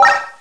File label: cursor